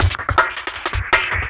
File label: Classic Beat, Drum N Bass